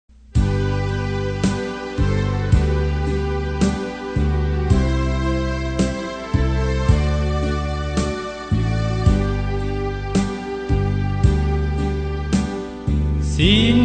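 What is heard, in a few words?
soprano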